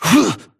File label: grunt hits; Titanfall 2